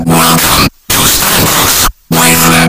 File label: vocoder